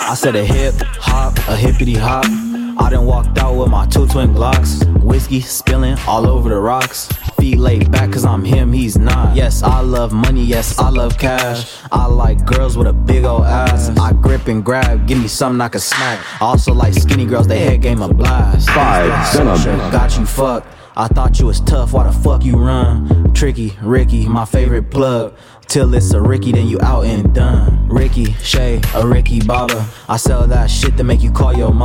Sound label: Funk